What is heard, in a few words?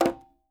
Quinto, Percussion; Sampleheads; NYC Percussionworks